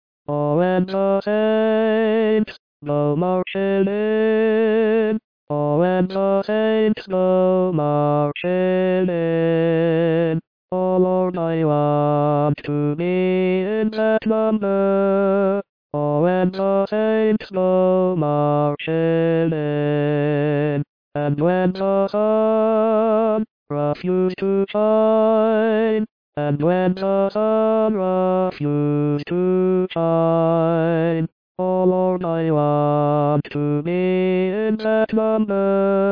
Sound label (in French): Tenor; When the Saints